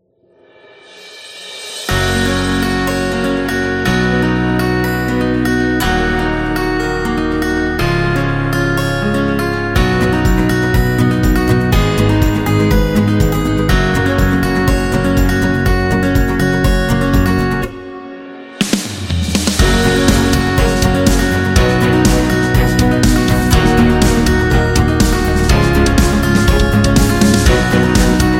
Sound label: Energetic Indie Folk; happy music vol 9